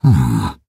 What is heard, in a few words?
Nonverbal Happy; Ornn